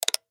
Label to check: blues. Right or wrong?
Wrong